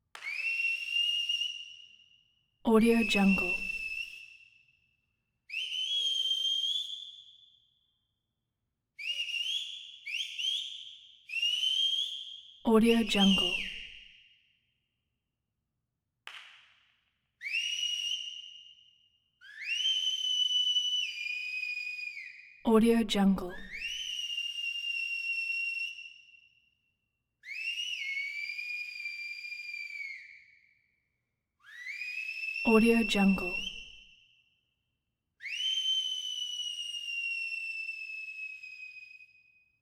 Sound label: stadium whistle; human sounds